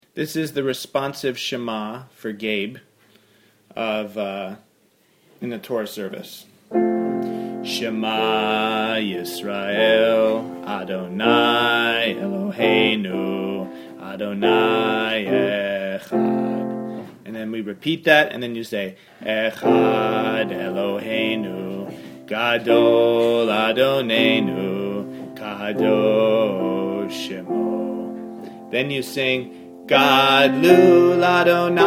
responsive shma low voice

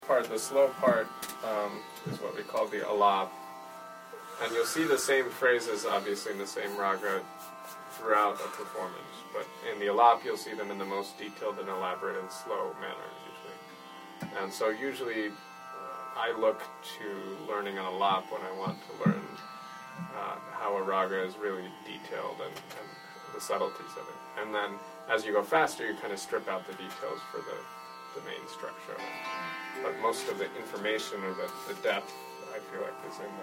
key phrases alap

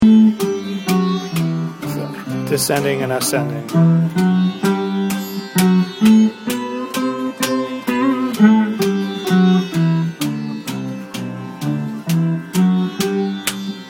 ascending and descending iman kalyan

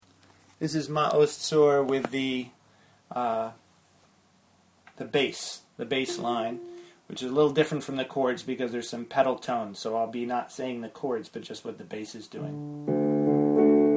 maoz tzur bass

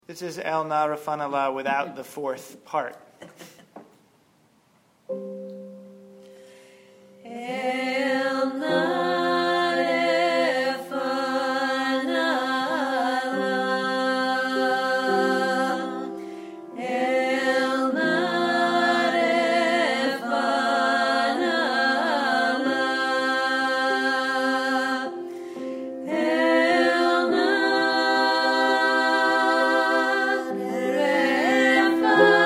el na vocal

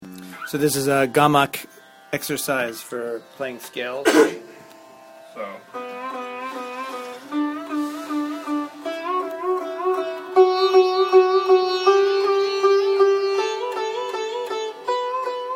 gamak gliss

